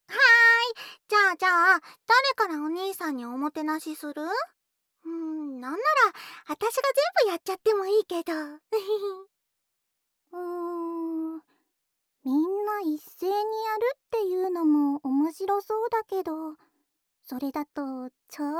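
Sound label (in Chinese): 要对着你可爱的耳朵呼呼吹气了哦♪（吐息，双耳）; 栉形和爱宕的吐息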